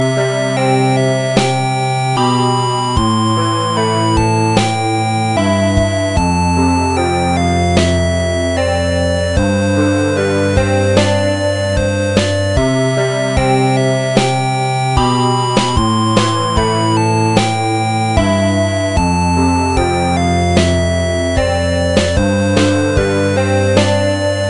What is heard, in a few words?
Flute Player Remix